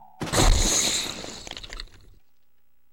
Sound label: Crush; Psi Ops